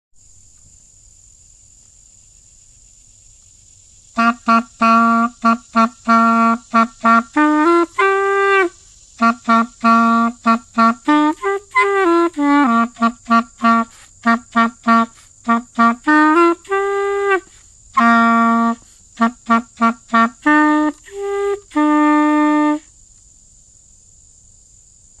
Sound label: Clarinet; William Tell